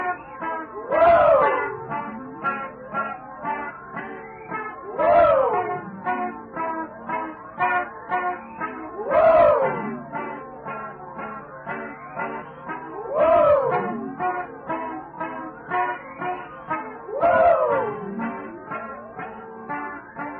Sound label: polyphonic woo